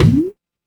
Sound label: sailor collision; arrgh-hoops